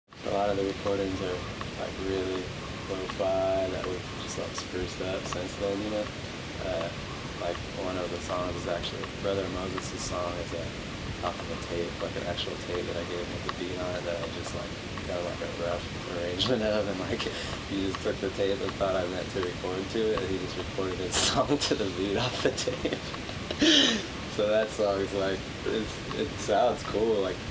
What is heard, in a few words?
beat lofi